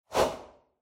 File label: Camera Motion High; シーン・カメラモーション